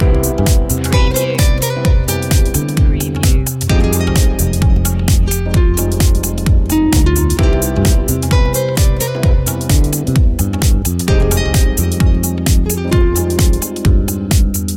ethnic night life loop

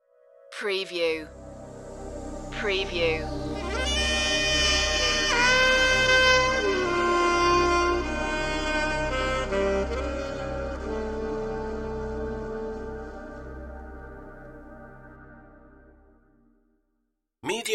hot club underground sax stinger

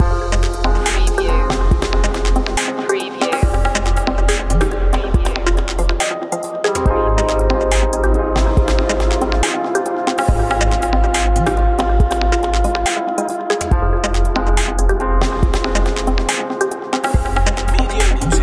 deep dub garage loop

